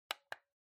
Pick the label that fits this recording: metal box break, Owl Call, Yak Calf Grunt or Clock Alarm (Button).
Clock Alarm (Button)